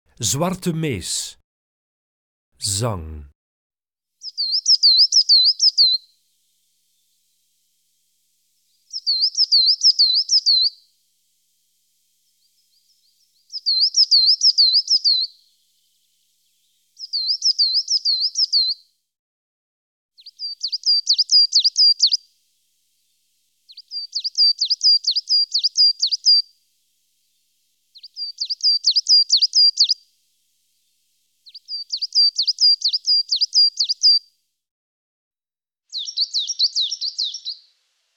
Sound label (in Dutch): Zwarte mees, Zangvogels; IVN